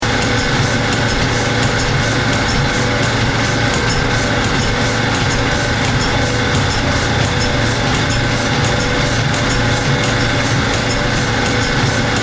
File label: Large Diesel engine with piston; Motors